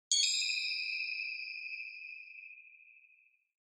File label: Single Accent Multi